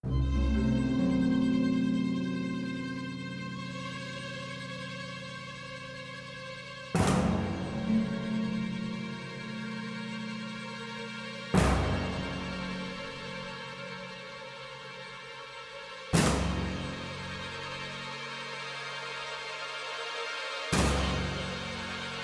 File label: Tension; Pillars of Eternity